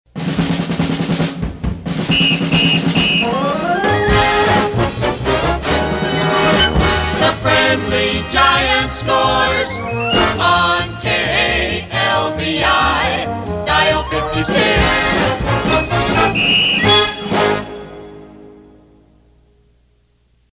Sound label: All American Sports; PAMS Numbered Series; KLVI